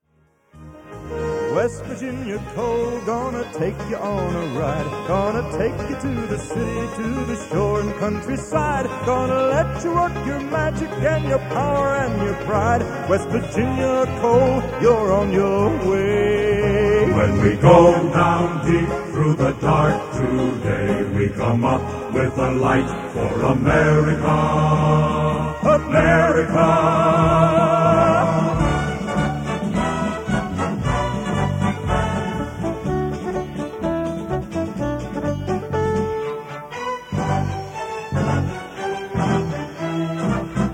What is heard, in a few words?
Coal is West Virginia Bluegrass Mix